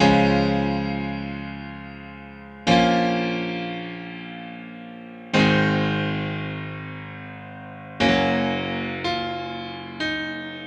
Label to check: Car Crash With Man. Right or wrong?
Wrong